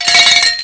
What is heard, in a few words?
GOOD cowbells